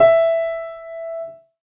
piano note 76

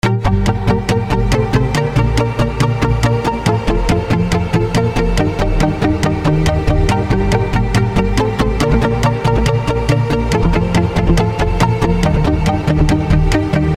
metronome example

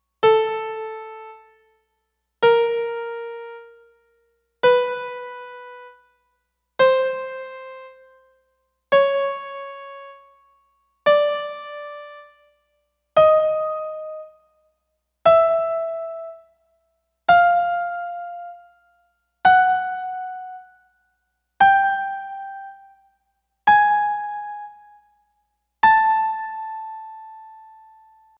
piano

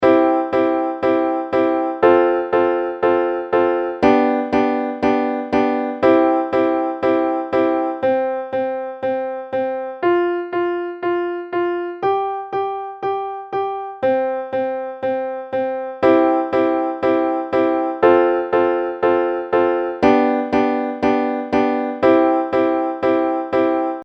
Chord Progression Example I IV V triad inversions; I-IV-V progression: inverted triads, root notes, inverted triads

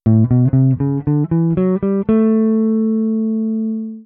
The Bebop Dorian Scale

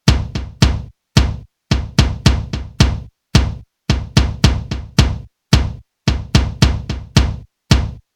Kick Drum multiband compression

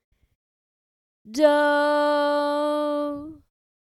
sung tonic; Tonic "do" of Melody 2

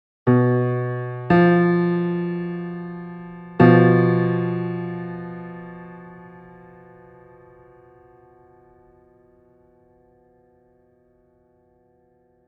Modal Improv

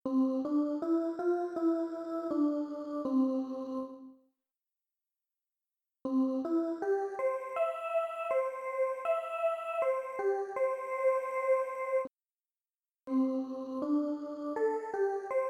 melody examples

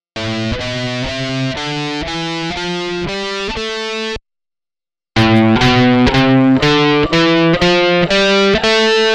Pickups More Distortion

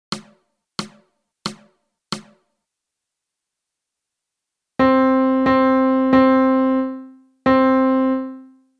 Melody; Lesson 1 Medium; Read Rhythms

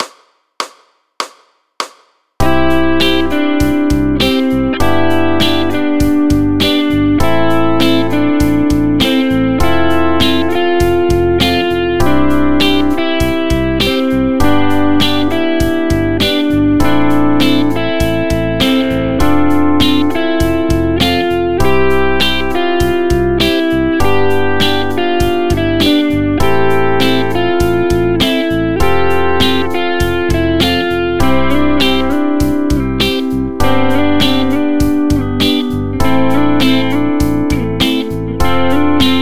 solo; Tension and Release Melody Example Over I-V-vi-IV Progression

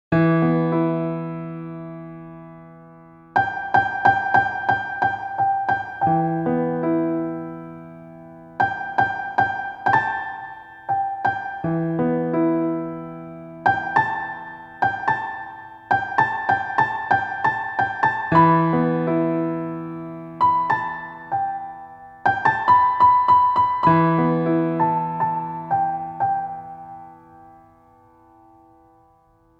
Modal Improv; Three Note Improv (G, A, B) over E drone

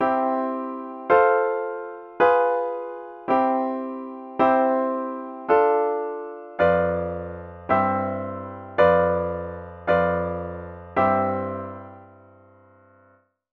Chord progression with diminished chords

